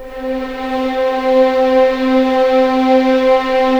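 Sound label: C4 legato violin, right, The Ultimate String Collection; East West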